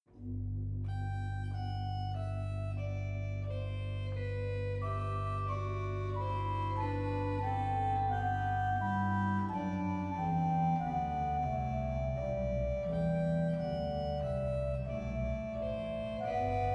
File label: Orgelbuechlein; BWV 619; Christ du Lamm Gottes; Enregistré le 4 avril 2020 sur l'orgue virtuel Klapmeyer de l'église St. Nikolai d'Altenbr